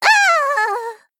Female Gnome Death

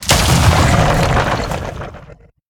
Earthen Guardian Death

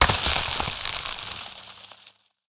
Foliage Death Underlay

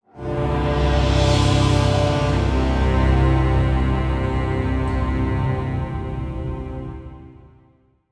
Stinger; The Harvester Of Envy

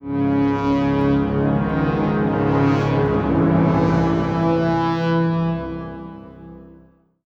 Stinger; Sunshard

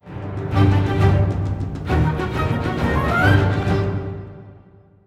Neutral Goblin Stinger

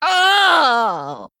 Male Gnome Death

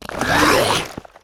Carnivorous Cube Attack

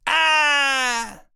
Gnome Panicked Death Underlay

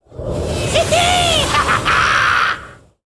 Rocket Launcher

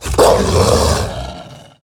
Mastiff, Play

